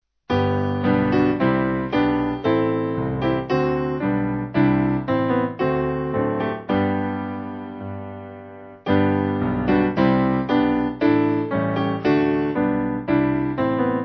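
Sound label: Piano, Music Backing Files for Churches; Come And Sing; Ar Hyd Y Nos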